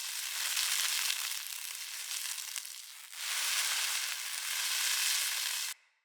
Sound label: Fire Blade Ground Loop; Characters; Enemy; Abilities; Sinecure; Banneret